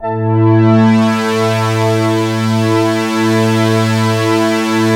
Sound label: MXWALL, MATRIX 12, Vintage Keyboards; Big Fish Audio - First Call Vintage Keyboards